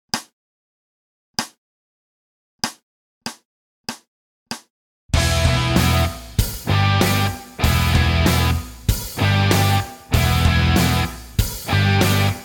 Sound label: rock backing track; prelimnary stage